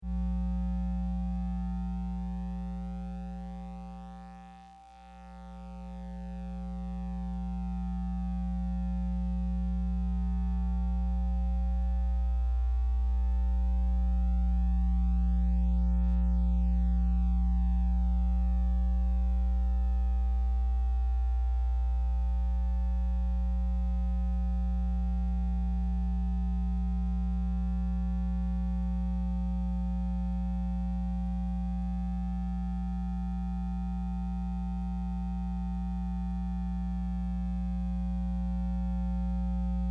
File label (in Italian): elettromagnetismo recinzione elettrica; petropolis